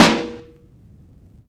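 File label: Vinyl Snare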